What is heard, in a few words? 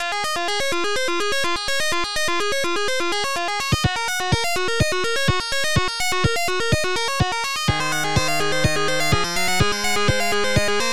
tune